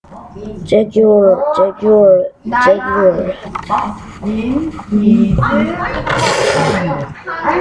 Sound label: jaguar